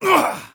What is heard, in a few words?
hurt medium